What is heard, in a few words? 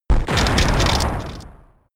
Rock Smash; Pokemon; Gen 5; Blk Wht Blk2 Wht2